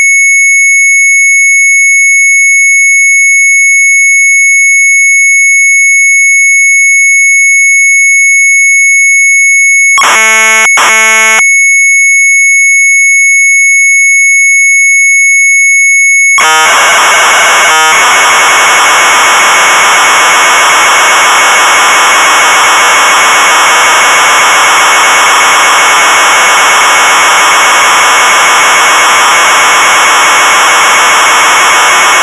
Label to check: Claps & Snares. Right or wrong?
Wrong